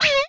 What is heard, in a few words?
SciFi Gun